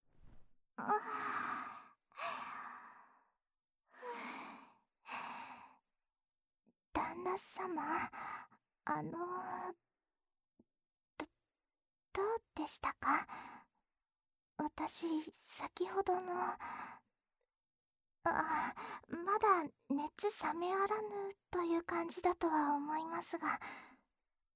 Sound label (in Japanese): 添い寝